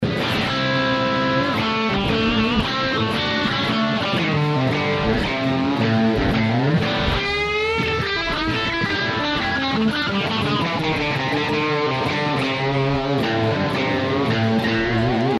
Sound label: dual, guitar; boss gt-8